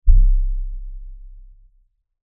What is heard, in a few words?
Impact Lfe Rumble; Low Impacts (Sound Effects:Impacts); Blastwave